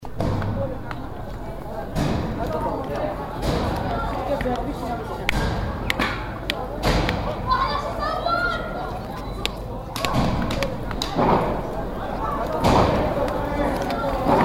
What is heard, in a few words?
Stones Gunfire